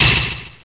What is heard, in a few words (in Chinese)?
其他-清脆敲击